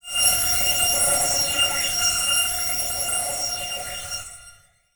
Transition Stab Dark Bell; Production Element

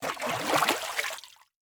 Liquid Water Water Scoop Up In Cup

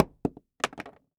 Household Knife Butcher Small Hitting Solid Wood

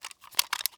Weapon Pistol Handled In Hands; AdobeCC

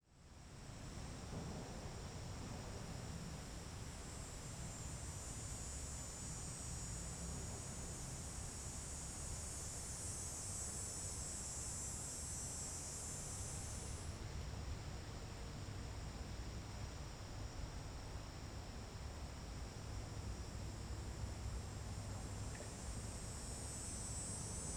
Ambience Nature Near Swamp; AdobeCC

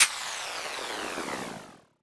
Title Transition Power Down; Production Element; AdobeCC

